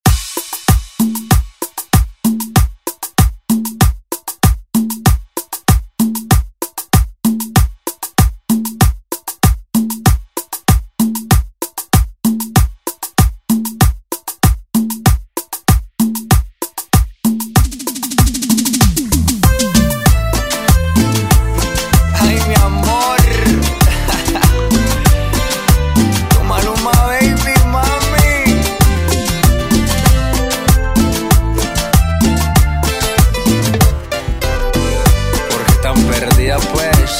Latin Redrum; Deejay Hit

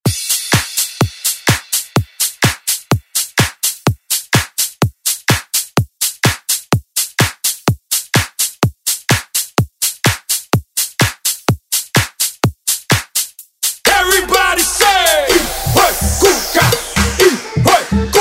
Latin Dance; Redrum

